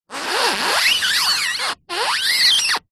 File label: vehicle wheel turn tyre squeak road close up isolated clean